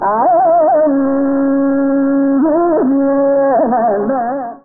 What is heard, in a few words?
Kambhodhi Shruti C